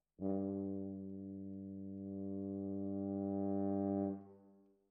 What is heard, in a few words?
Tromb ASS